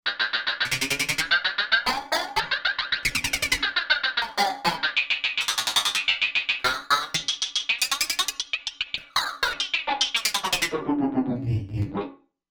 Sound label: Broken Arp